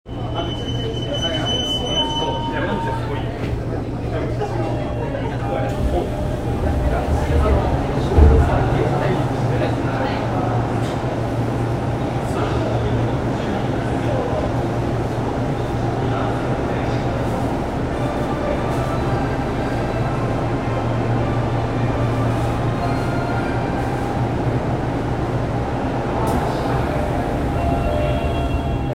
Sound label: train sounds; 東西線高田馬場西